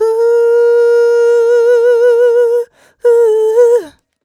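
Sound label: "uh" female, 127, e-flat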